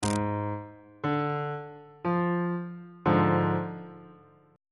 c double sharp diminished 2nd inversion on bass clef